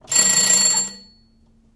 Doorbell Ring

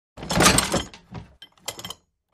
Metal Big Clunk

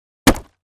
Axe Fire Log, axe hits

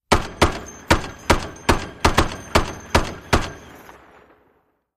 .50 Caliber: Multiple Shots With Shells Dropping

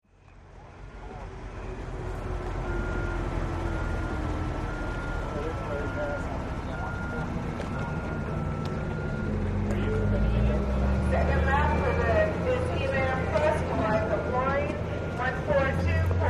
Hydroplane Dock Amb

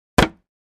Gallon Jug Hit Hammer, plastic

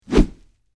Slow Pipe Or Heavy Obj, whooshes

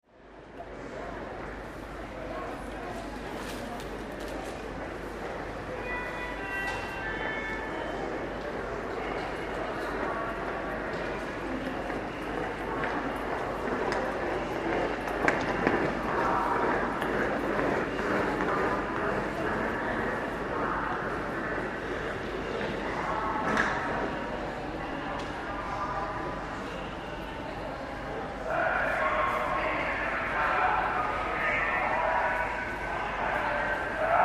Train Station Amb Li

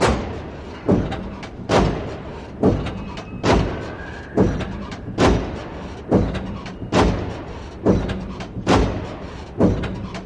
Rhythmic Mechanical, factory

